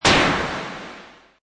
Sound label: Space Scifi Sound White Blast; White blast 1: Sci-fi space white noise burst. Sound design element for space blast or exp; Science Fiction Sound Effects